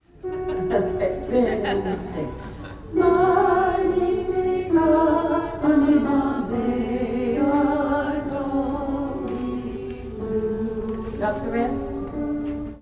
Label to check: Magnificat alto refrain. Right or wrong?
Right